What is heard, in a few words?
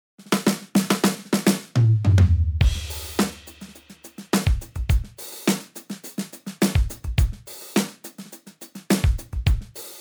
vintage standard, drums; handy drums